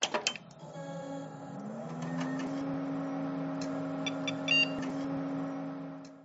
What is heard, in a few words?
computer start